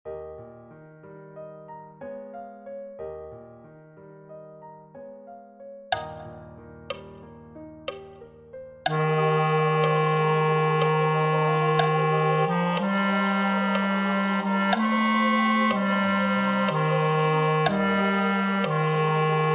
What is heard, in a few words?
Wedding chorus, B; In Windsor Forest